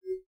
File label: Outofbounds Warning; Boomerang Fu